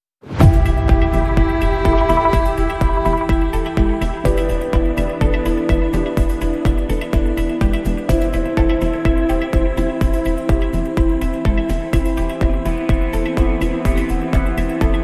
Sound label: No String Chords